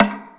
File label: Percussion, Drum Machines E-MU Pro-Cussion